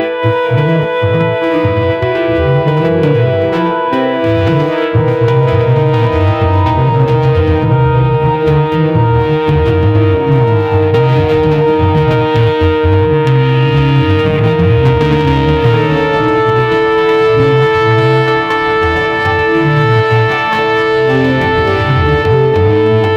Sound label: music chaotic mix of fast, sitar